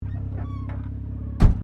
Car Mazda Rx7 1983 Series3 Interior Idle Door Close; Vehicles; Cars